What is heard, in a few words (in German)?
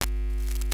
KNISTER; Xsample VOL-9; NOISE